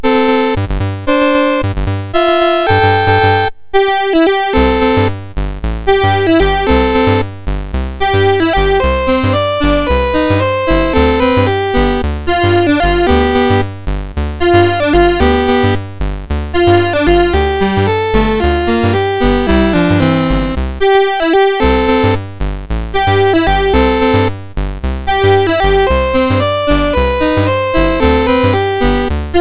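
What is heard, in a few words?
MOS 6581 SID chip; MOS6581R4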